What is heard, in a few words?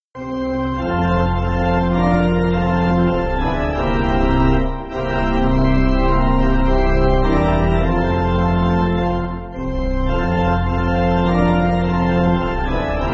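Lord Send Me, pipe organ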